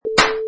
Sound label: Clap